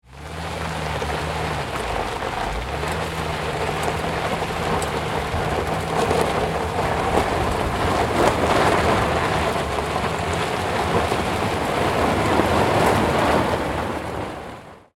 Car Ride Gravel, Transportation, Automobile, Car, Ride, On, Gravel, Rough